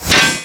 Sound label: First Hit; MonsterHunter